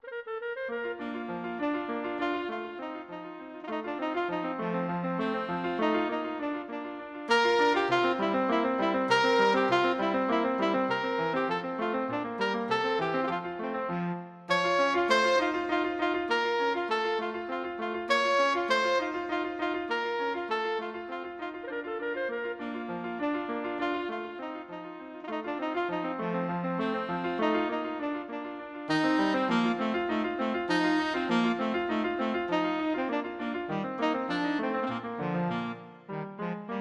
minuet, saxophone

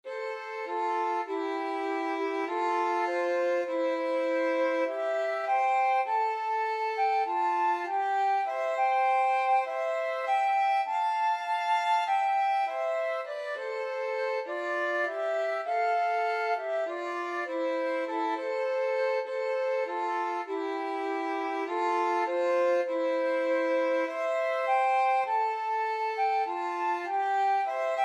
flute violin duet; mountain thyme

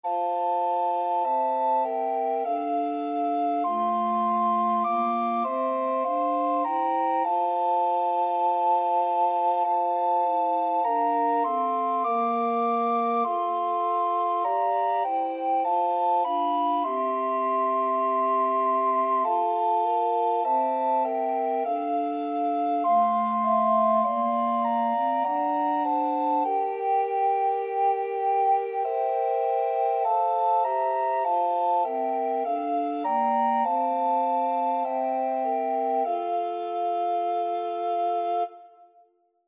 abide with me, recorder quartet

